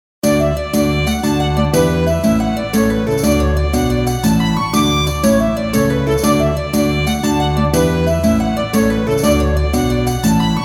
Old English Traditional Dance

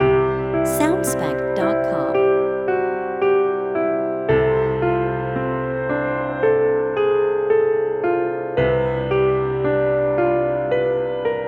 Rainy Day Lounge Lite Loop Track

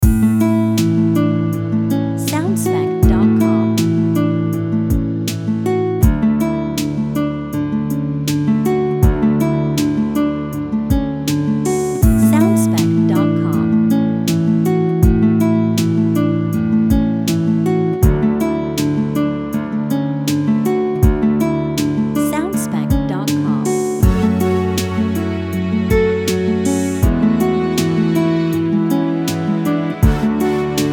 Positively Neutral Main Loop

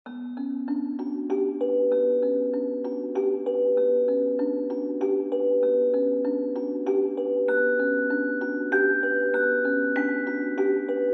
Music Box Nostalgia

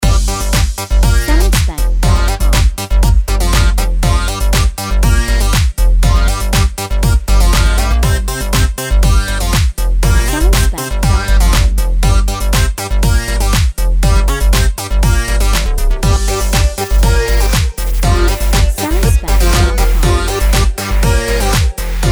Dance in the House verse line part

